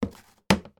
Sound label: household small bin lid open and close